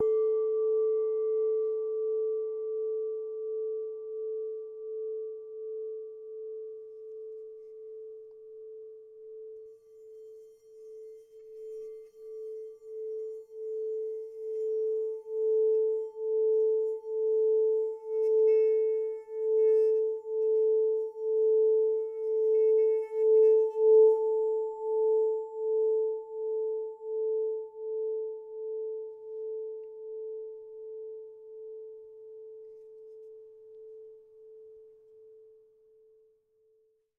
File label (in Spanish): SONIDO CUENCO AMARTILLADO